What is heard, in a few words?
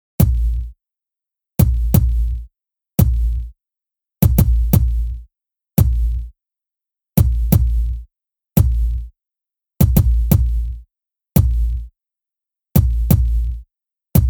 HipHop Kick